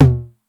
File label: 909 MID TOM, TR-909 KIT; Greytsounds VOL-1